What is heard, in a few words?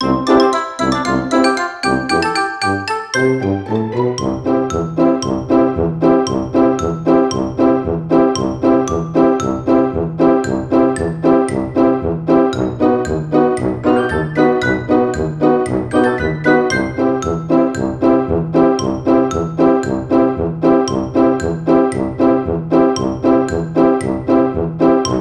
Dog Music; Ringling Bros. and Barnum & Bailey; Wii